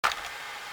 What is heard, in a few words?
draw, weapons; blockas2